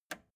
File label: record player repeat jump out release